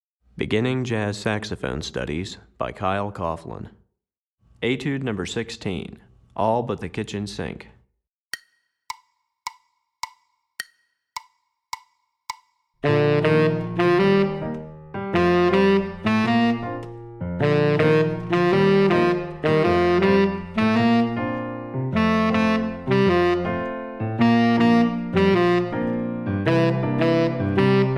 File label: Tenor Etude, Saxophone; Etude #16; Recordings For Beginning Jazz Saxophone Studies for Tenor, Volume 2; Moving Syncopation; Kyle Coughlin